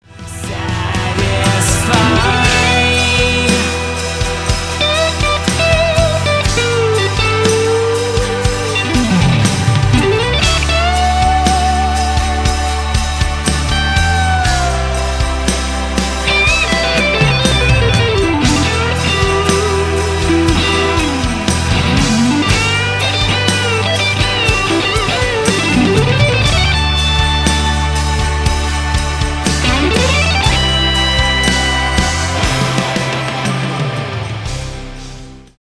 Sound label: solo